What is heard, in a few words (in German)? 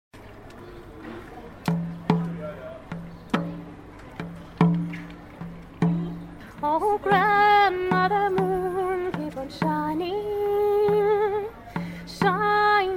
Trommel